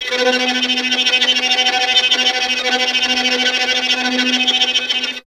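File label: string violin tremolo; Miroslav Vitous; Solo Instruments VOL-2; Solo lnst. II; Vln Trem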